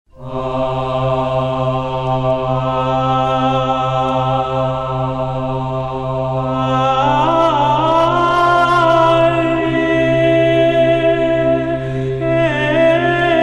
organum

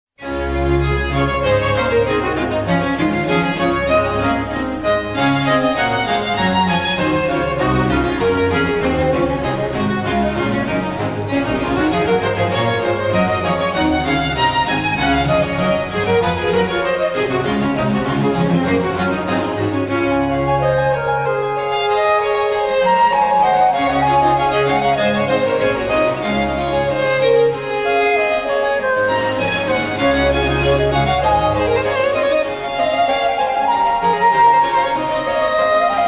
concerto grosso; Baroque

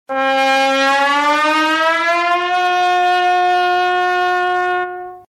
trombone slide

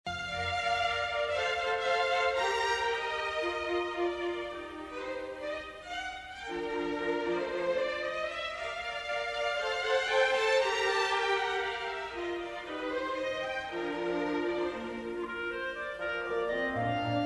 Theme major; Mozart Sym40 4th Mov Theme2

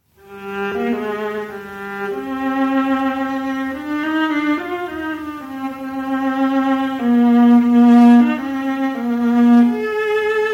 viola excerpt

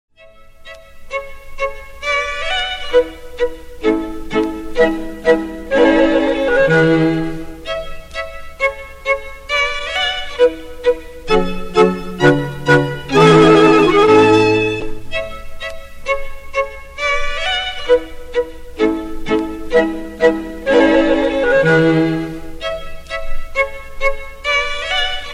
duple meter

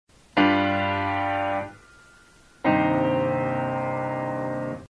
Closed Cadence

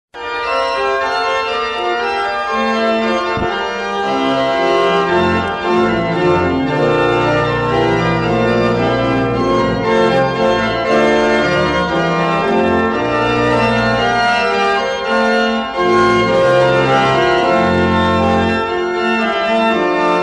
organ

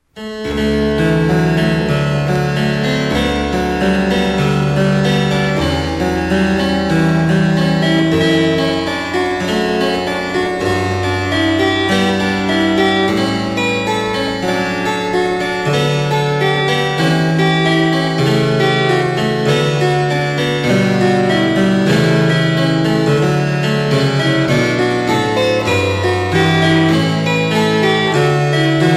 harpsichord

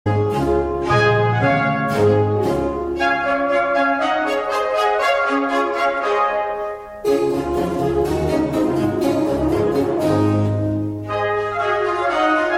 festive orchestra; Baroque

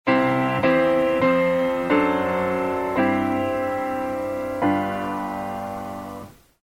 Open Cadence

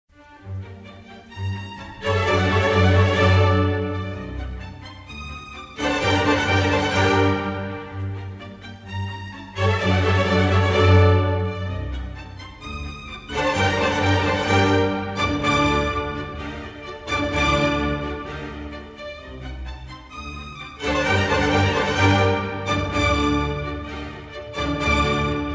Theme; Mozart Sym40 4thMov

